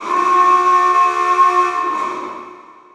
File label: prison alarm; Counter Strike Model Pack; CS:GO; survival